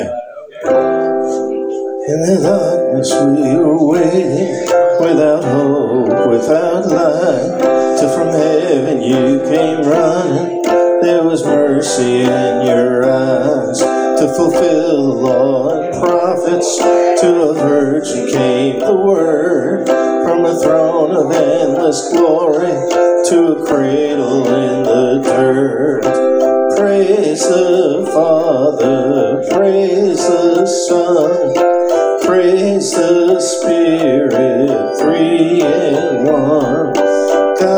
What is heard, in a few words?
King Of Kings Piano; live performances by zeb